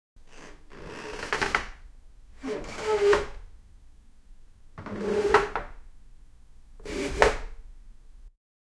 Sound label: chair being pushed back